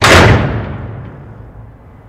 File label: Door Metallic Huge S